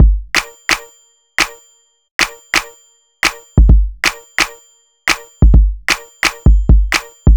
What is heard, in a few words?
BEAT